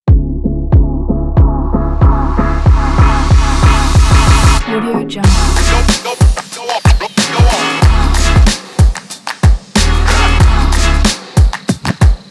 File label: hiphop